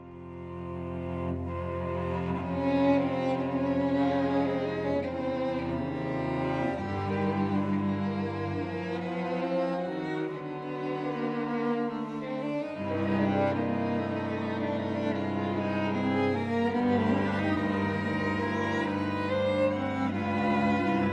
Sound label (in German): Introduktion, Thema und Variationen Violine, Viola, Violoncello; Sylvia Reichardt - Violine, Eberhard Klemmstein - Viola, Helmar Stiehler - Violoncello